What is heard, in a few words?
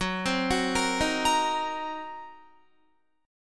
Gb 6th Flat 5th Gb down Guitar; arpeggiated; Gb6b5; JGuitar